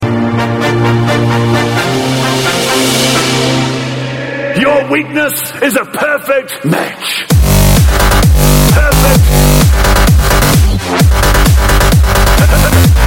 Perfect Electro

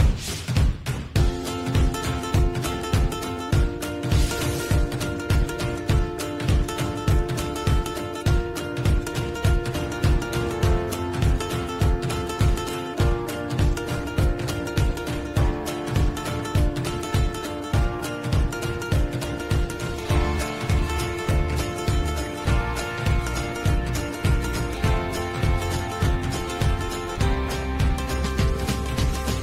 Instrument; Andi Mack

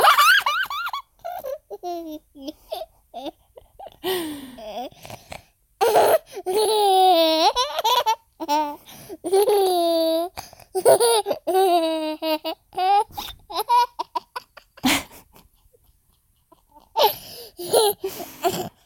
Giggles

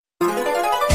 battle start

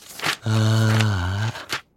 AAwwhhhh; Jiro

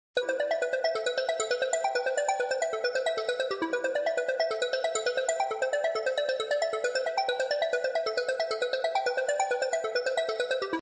Skydive

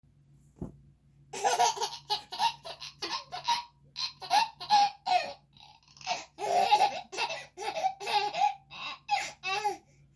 giggle

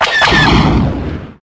blasters; Tie fighter

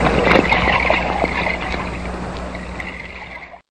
Water Splash

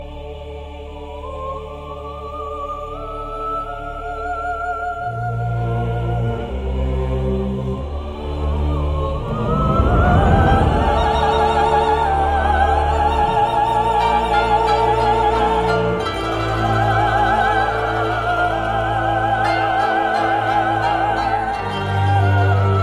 Sad Music By Band

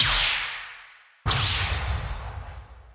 Beam Shatter

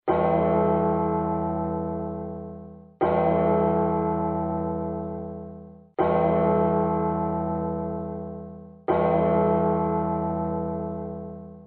Clock Bong

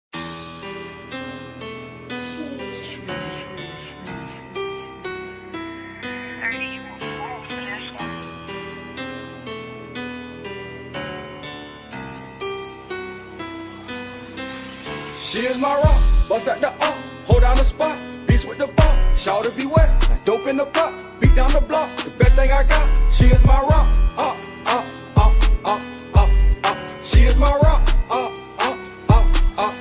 Rock

